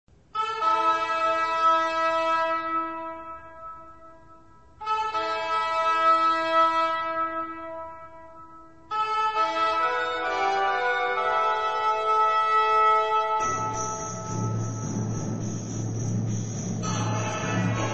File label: Medieval Christmas, Authentic Medieval Music